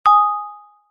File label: multimedia notification bell chime tone vibraphone